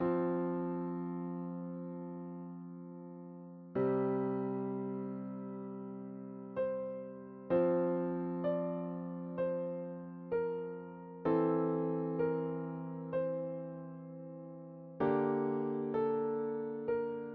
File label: piano; london bridge healing